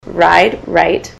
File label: ride right